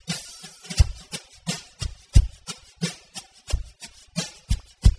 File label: drum